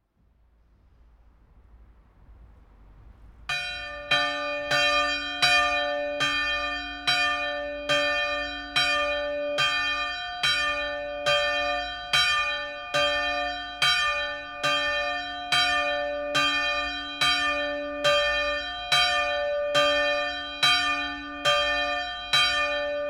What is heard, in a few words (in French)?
cloche; volée cloche 2; chenebougeries